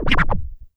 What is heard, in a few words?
Scratch OS; Sonic Foundry; Rondo Brothers Trip-Hop Loops & Samples; New York Kid